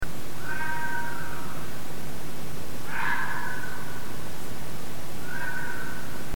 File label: foxes